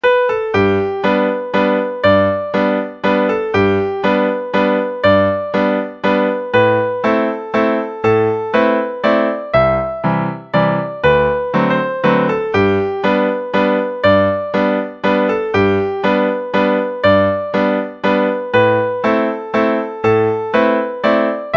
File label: tombigbee waltz